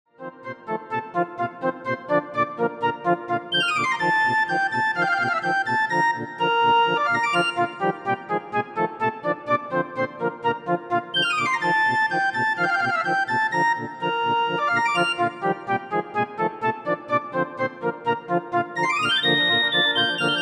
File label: Flute Ensemble